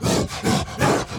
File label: Enraged Breathing Loop; The Town; Burns